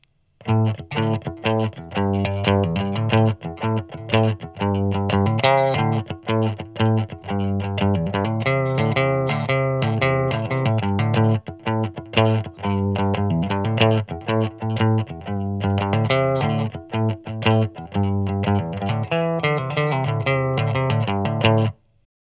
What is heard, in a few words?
Funky No EQ